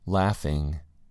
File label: laughing